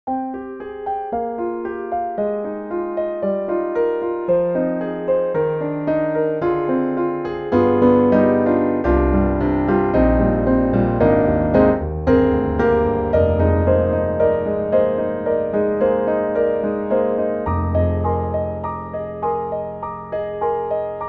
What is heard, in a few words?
Roland INTEGRA-7 Deep Grand (SuperNATURAL Acoustic Tone)